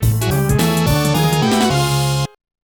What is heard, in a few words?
Match Lost; World PK Soccer V2; Jaleco; Mega System 32; arcade